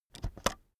keyboard external space press slow soft

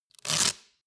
velcro undo roomy short

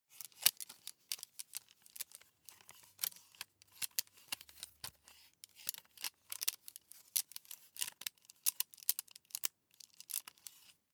meter handle

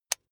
electric button drill direction switch lock heavy

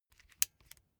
bicycle street gear control upshift

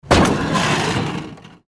cars colliding

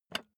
office chair lever up

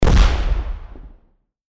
explosion; cortex

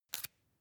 match light fail

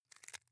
wood chip small break creak soft

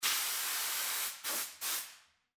compressed air

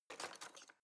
knife wood drawer grab

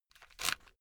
headphone retract unsteady

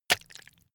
intestines hit

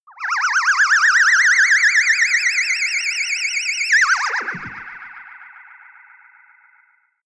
liftoff and ufo landing

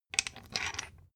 thread screw remove

